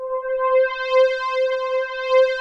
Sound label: JUNO STR